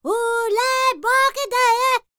Vocal Planet World Voices, NOMADIC

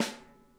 SNARE, Drum Kits

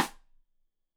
Side Stick Stereo; Deeply Sampled Drums; SMDrum

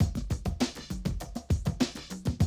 drum loop, 100 BPM

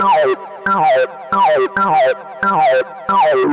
Cheeka 136 C, future rave samples, 136bpm

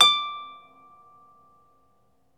Troubador Harp

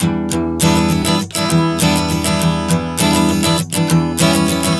Strum 100 Em, One Chord Strumming 100 bpm

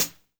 LOFI HH, JUNGLE KIT; Drums In Your FACE!

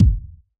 hip hop kit kick

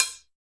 RESOSTIK F; TEK PERC2

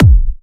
Trance Kicks, Bassdrums

